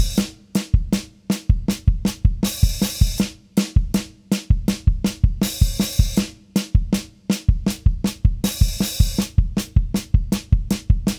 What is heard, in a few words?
Music DownBeat Perc; Plants vs. Zombies Battle for Neighborville; GnomeGnight